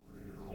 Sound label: wave; Mindustry